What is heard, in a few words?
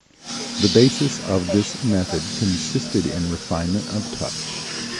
noise